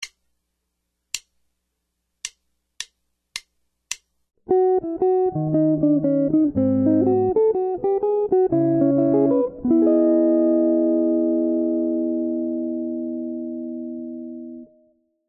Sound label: jazz guitar solo arrangement single bass notes